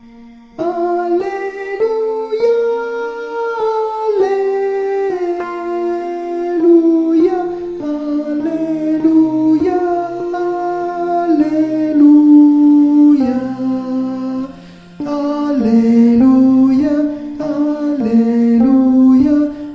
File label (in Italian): Alleluia Contralti; Gabrieli